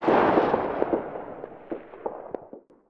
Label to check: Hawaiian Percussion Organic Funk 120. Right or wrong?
Wrong